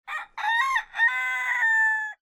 rooster cock a doodle do

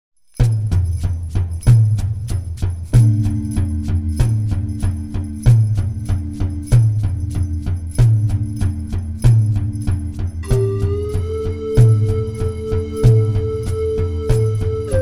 Dance of Flame Native American; Soundzabound Volume 2 Disc 3

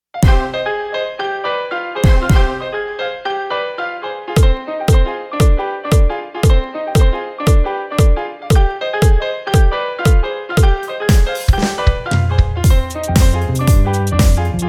No Brass Full

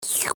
Slurp

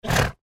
donkey snort

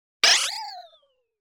Misc Cartoon Sound; Soundzabound

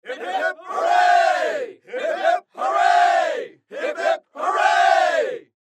Hip Hip Hooray

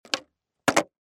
skateboard ollie land

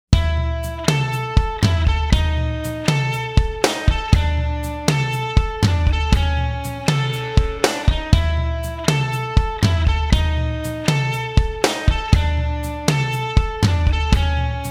Groovy Jam; Soundzabound Volume 4

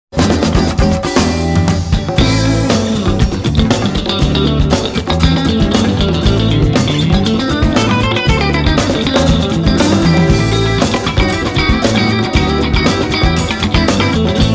Bluez; Soundzabound Volume 4 Disc 1

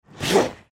skateboard

